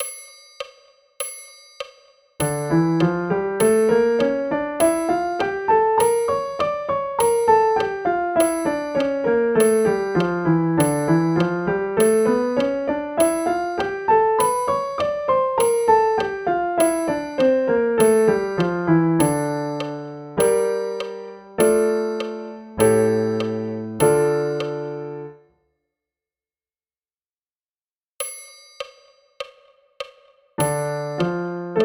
D minor scale and arpeggio; bastien

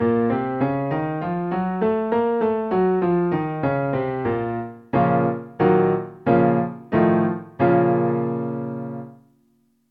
B flat harmonic minor scale and primary chords

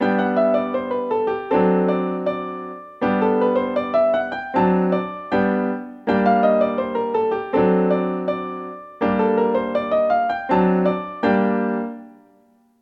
16th note in G and Gm

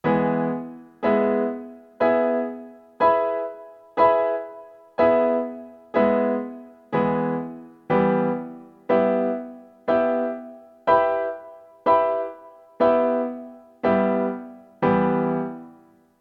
triad and inversion block chord in E and Em

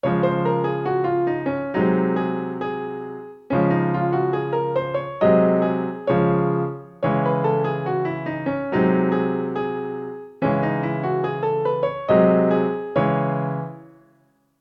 16th note in D flat and C sharp m

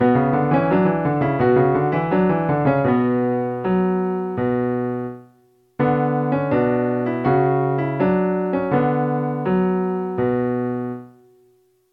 dotted 8th note in Bm

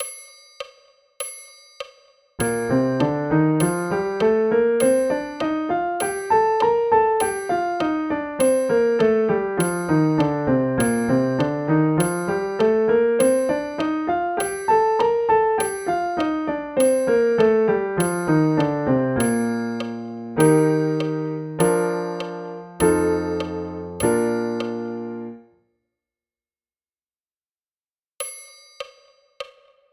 Bb Major Scale and arpeggio; bastien

